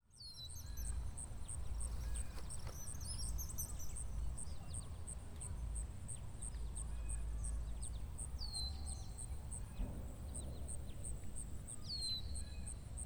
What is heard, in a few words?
Ambience Wilderness; AdobeCC